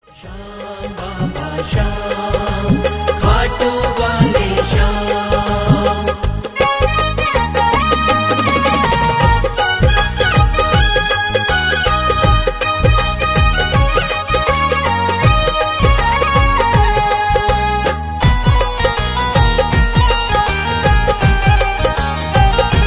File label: Khatu Shyam Bhajan